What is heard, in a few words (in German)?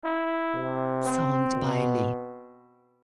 Trompete Grundton